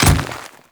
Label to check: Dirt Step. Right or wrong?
Right